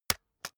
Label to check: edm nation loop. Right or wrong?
Wrong